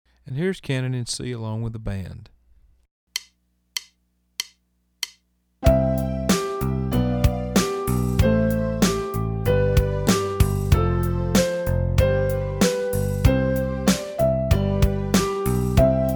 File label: Canon In C Fast